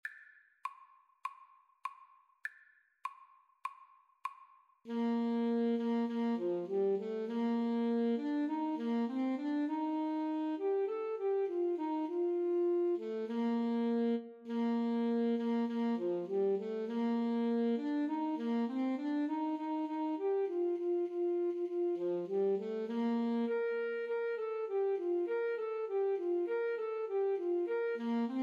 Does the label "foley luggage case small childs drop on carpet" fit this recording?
No